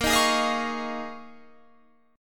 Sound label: Eb 9th Suspended 4th